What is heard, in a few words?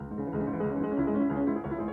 The movement starts with a menacing rhythm.; Beethoven Piano Sonata No. 14 Moonlight Sonata